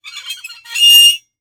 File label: Horror Scary Metal Screech Swing